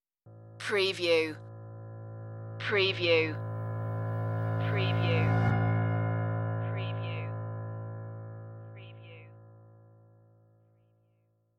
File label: corporate sooth stinger